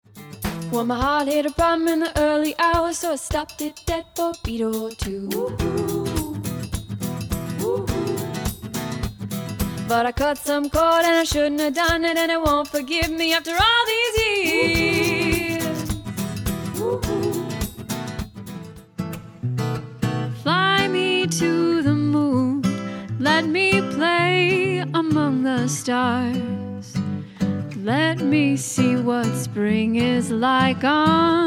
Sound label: Vocal